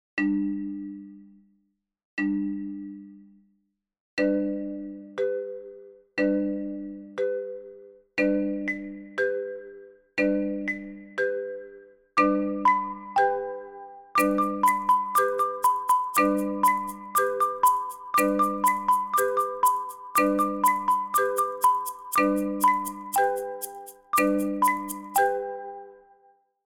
instrumental; Rain Rain Go Away

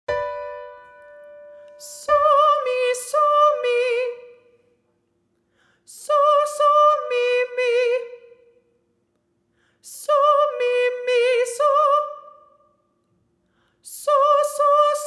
Solfa, Key G

